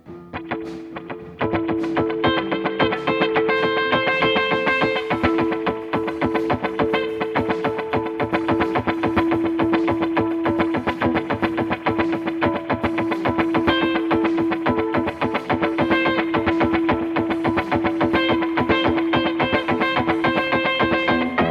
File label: guitar